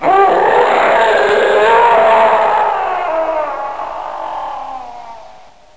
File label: monster scream